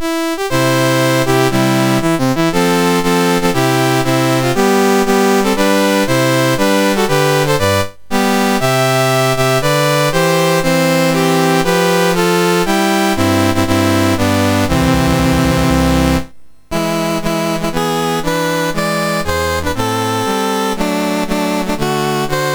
Sarawak, National Anthems, MOS6581R4; Dave Marquis

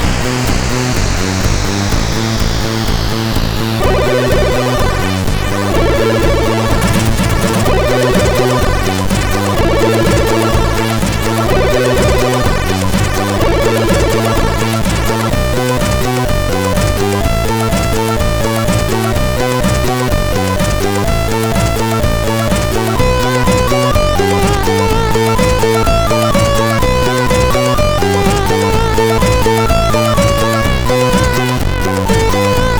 Old Elite-loader Remix, sid CSG8580R5

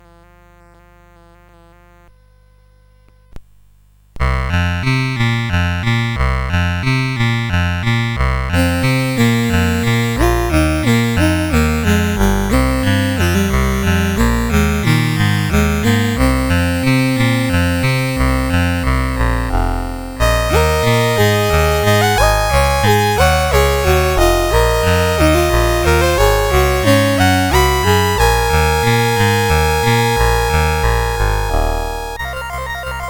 Fourth Chord Experiment, CSG8580R5

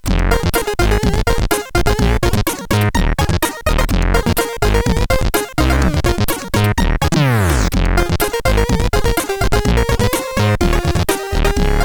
Why Beep None sid CSG8580R5; hvsc

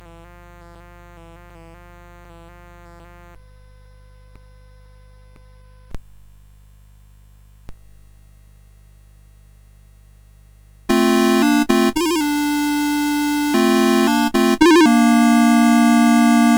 Arab Dance, CSG8580R5

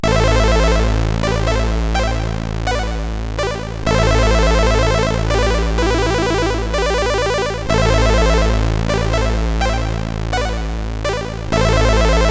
Blow Flight, CSG8580R5

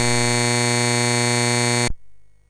Final Synth Sample, MOS6581R3

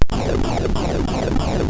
FX, MOS6581R3; HVSC; Software House

